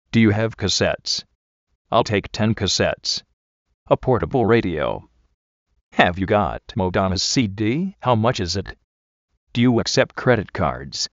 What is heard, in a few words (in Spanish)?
musica